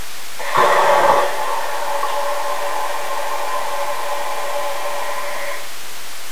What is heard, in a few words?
flushing; The flushing noise